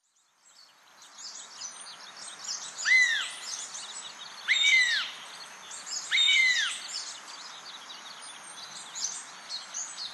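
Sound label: Northern Goshawk; mooka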